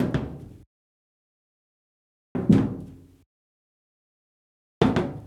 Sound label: Footsteps On Metal Platform Wearing Brogues